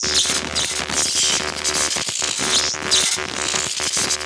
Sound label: double dash; Pix the Cat